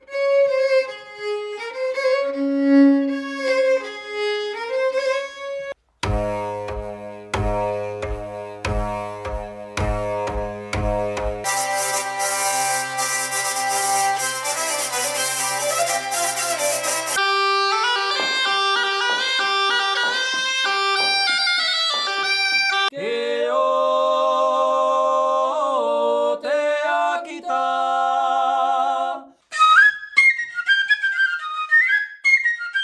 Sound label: medley 9 instruments intro